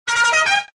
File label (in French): Klaxon Italien